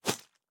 Cut

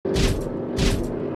Walk; starbites

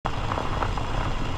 Walk

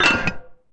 Manhole

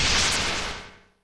Slash; Touhou Jitsuzaisou Dream Logical World

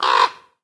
AMB Village; gamerip

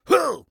Pirate Birdnest

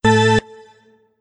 Count Down; Burnin' Rubber 5 HD

